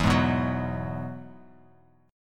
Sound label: E Major Add 9th E down Bass Standard; strummed; JGuitar